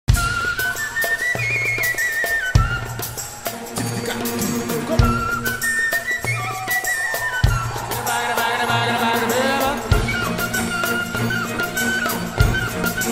flute ringtone; bombay

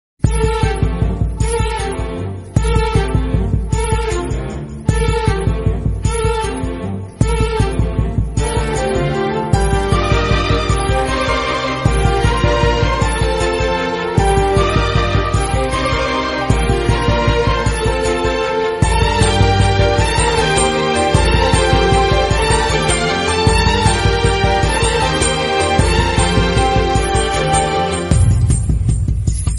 amma sad ringtone bgm emotional bgm mother song; vip

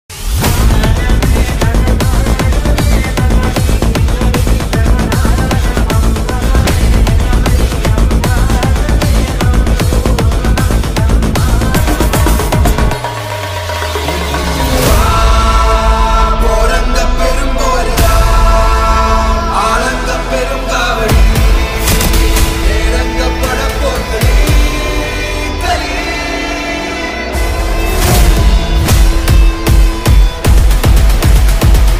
malayalam trailer bgm love ringtone; turbo jose

